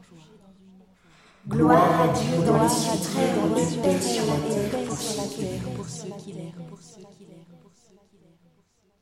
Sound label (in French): voix gloire a Dieu